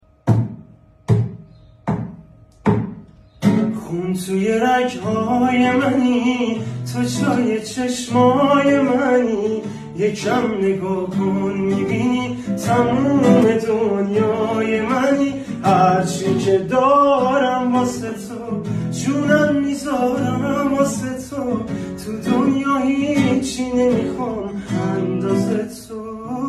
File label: Guitar